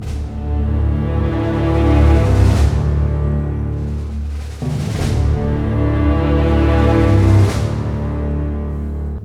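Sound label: 72 danger, orchestra; East West; Scoring Tools; Danger There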